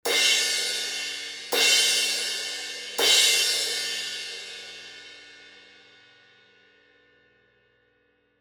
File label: 19 zildjian thin crash hits